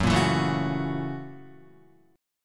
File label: F sharp 7th Sharp 9th, Guitar; strummed; JGuitar